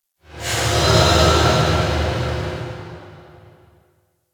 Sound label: Large Cargo Room Dist Amb; Warframe; Ambience